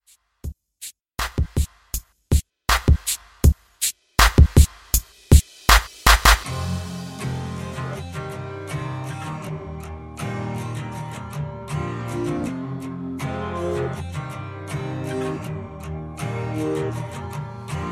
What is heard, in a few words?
Pop Redrum; Remixfreakz